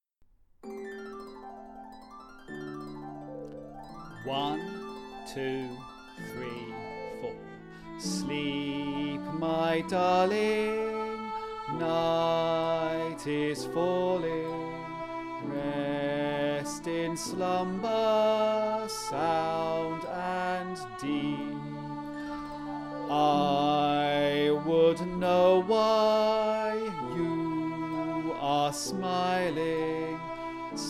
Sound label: Sleep my darling sung with voice; Teachers' guide tracks